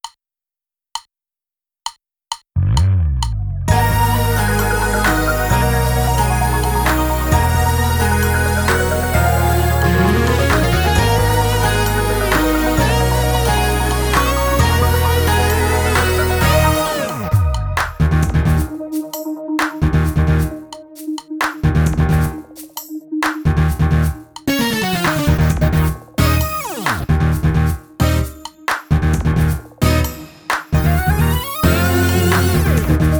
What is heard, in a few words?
Fusion drumless